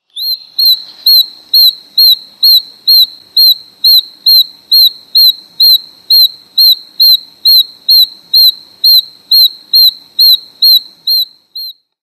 Oak Toad; herps of NC; anurans

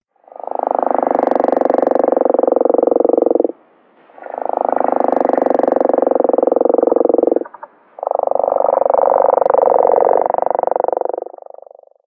Gopher Frog; herps of NC; anurans

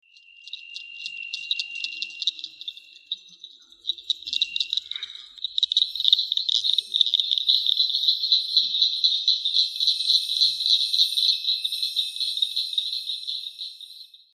Northern Cricket Frog; herps of NC; anurans

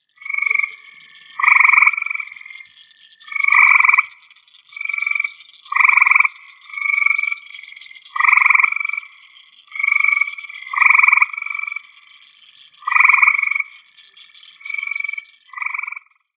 Common Gray Treefrog; herps of NC; anurans

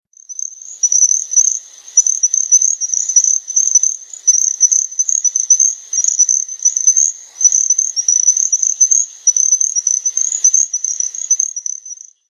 Little Grass Frog; herps of NC; anurans

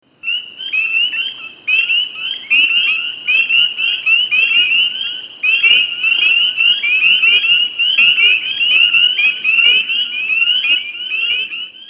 Spring Peeper; herps of NC; anurans

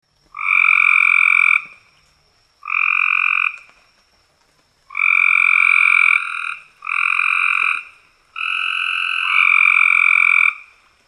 Cope's Gray Treefrog; herps of NC; anurans

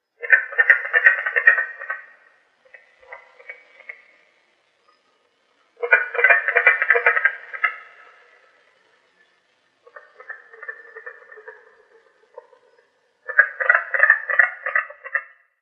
Carpenter Frog; herps of NC; anurans

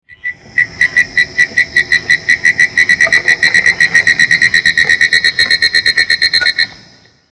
Pine Woods Treefrog; herps of NC; anurans

